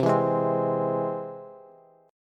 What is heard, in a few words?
C Augmented, Bass, chord; strummed; JGuitar